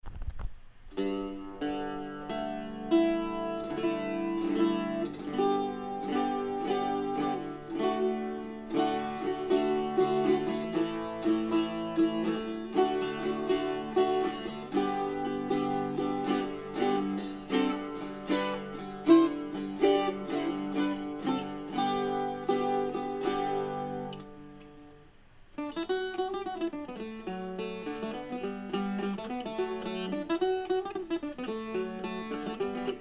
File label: Gallatin D hole Octave, mandolin